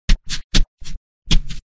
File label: sticky creature footsteps